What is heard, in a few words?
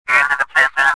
probe talk; Star Wars Jedi Knight II Jedi Outcast; XBOX